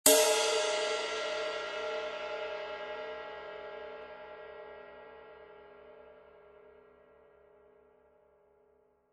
20in Custom Projection Ride, cymbal sound

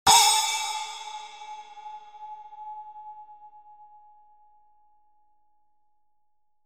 8in Custom Dark Splash, cymbal sound

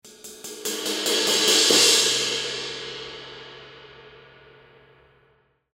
K Custom Special Dry Crash Build Crash, cymbal

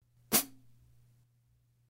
Chick, cymbal sound; A Avedis

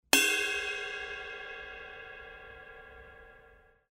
K Con Med Thin Ride Low Bell, cymbal sound

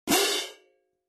20in Symphonic French Tone Pair, cymbal

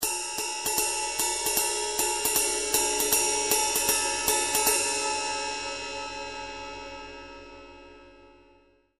ZBT 20in Ride

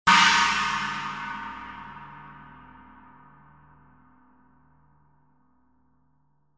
19in China, cymbal

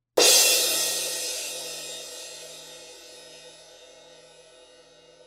S Rock Crash Crash, cymbal sound

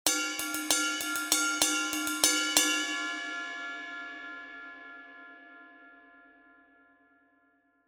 20in Crash Ride, cymbal sound

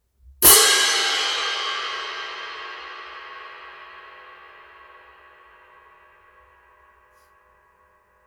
18in K Symphonic Pairs

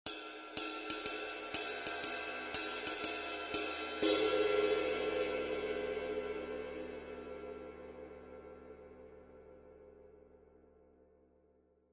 22in Custom Medium Ride, cymbal

